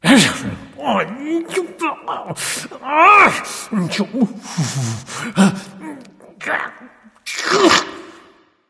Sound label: grumble; smite; ganesha